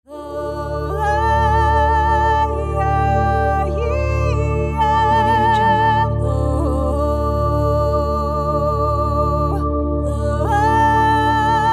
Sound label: mystical voice ident